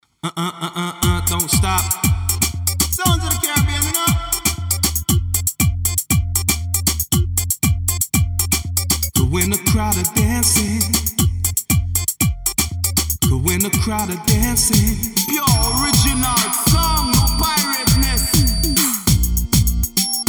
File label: Dancehall